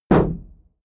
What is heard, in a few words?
shield sheet impact dent soft